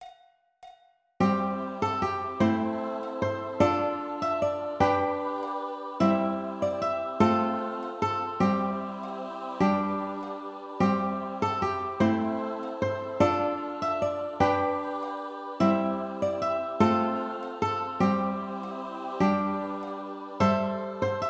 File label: Skye Boat Song Ukulele